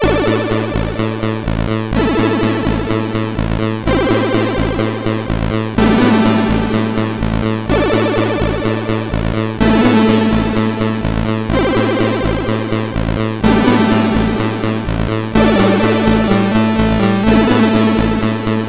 Arpegios, CSG8580R5

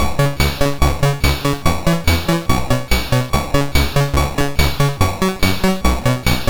Synth Sample III, MOS6581R2

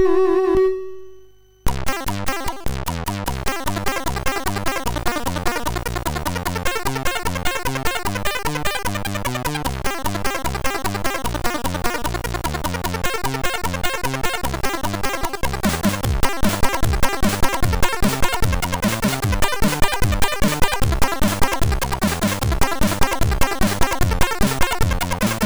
Country, MOS6581R3

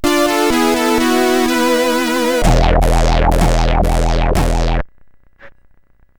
Double Sphere, CSG8580R5

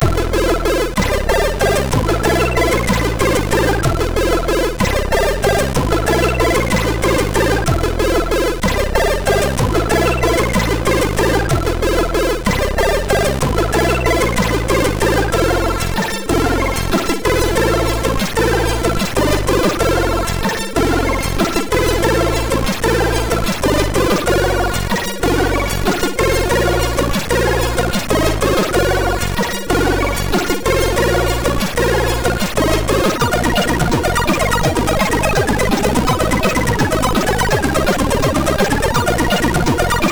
sid MOS6581R3

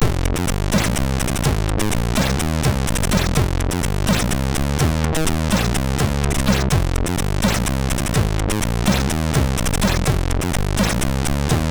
sid MOS6581R3

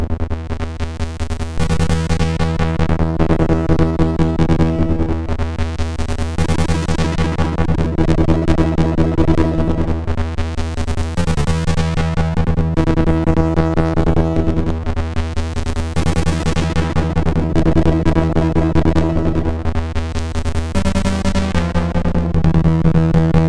Loop, sid MOS6581R2; Fredrik

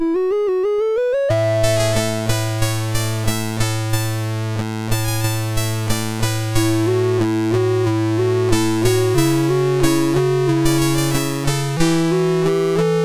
Synth Sample; MOS6581R3

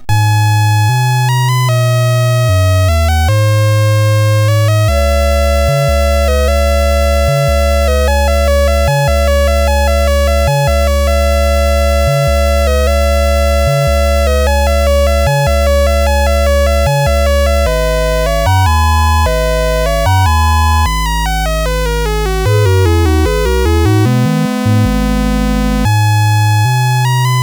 sid CSG8580R5; Lunattack

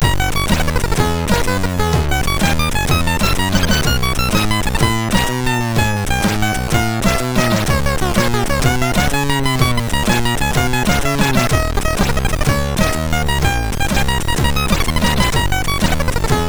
sid MOS6581R3

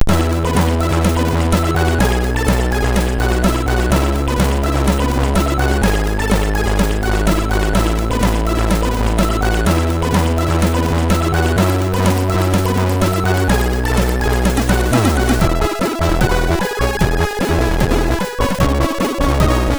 sid MOS6581R3; Rabbi; XXL

